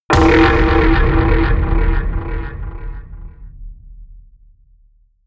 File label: low drum; whooshes rises